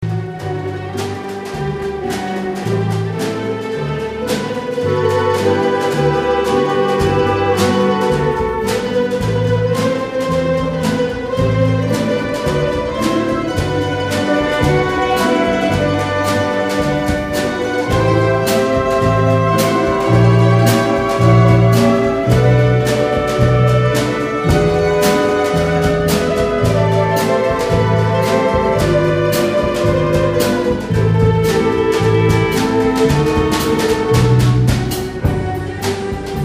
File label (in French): Chant sans paroles